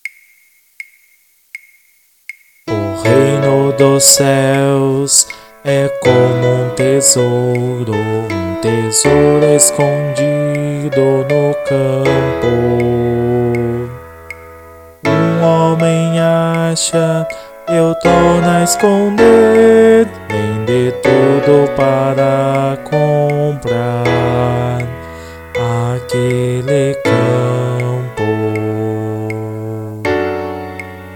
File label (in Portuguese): Voz estudo Cantico de Comunhao O Reino dos ceus